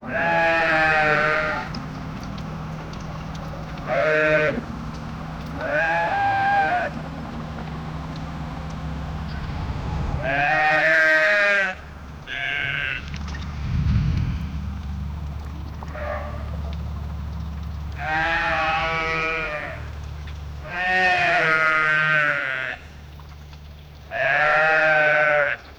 tige, deer

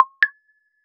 glassy tap with faint arc, magical

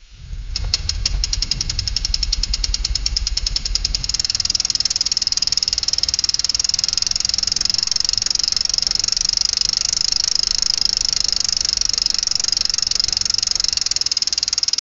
the bike sound

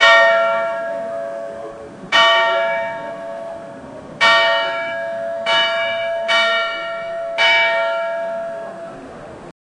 solemn church bells and

